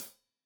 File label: Hat Tight, Hi-Hat, Cymbals; Hat Tight 1; Stereo; Deeply Sampled Drums